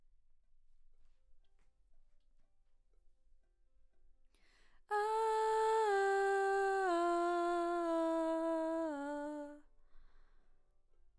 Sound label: BG Vox